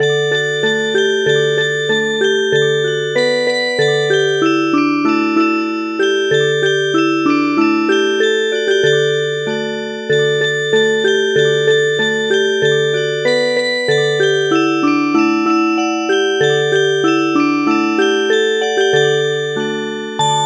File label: Japanese Processional Tune Music Box